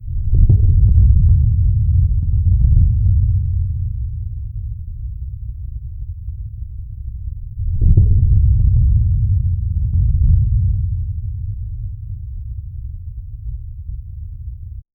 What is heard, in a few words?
realistic sound of an air, air defense